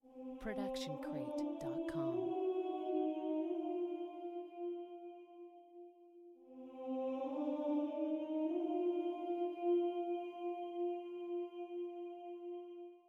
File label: monk praise; soundscrate